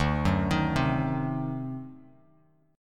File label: D 7th Flat 9th D down Bass Standard; D7b9 arpeggiated; JGuitar